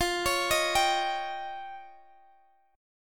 F 7th Suspended 2nd Sharp 5th, Guitar, Half Step Down; F7sus2#5